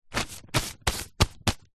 foley newspaper rub pat hand; zapsplat

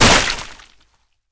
Body Splat; Blastwave FX

